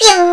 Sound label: Shot; Bob vs The Aliens